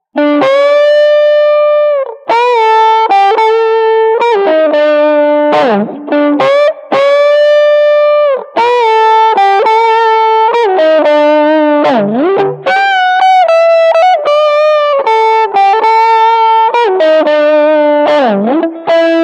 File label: guitar